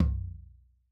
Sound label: HIGH TOM, drum; tom 2; Sonimus; s series drum pack; STONEQ 4K; SATSON; SATSON CS